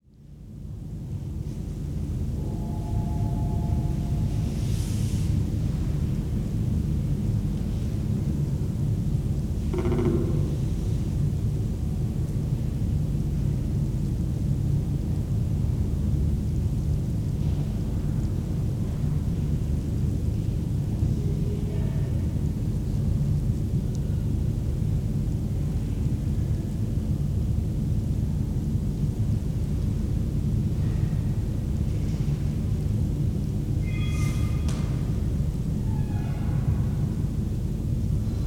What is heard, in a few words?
state museum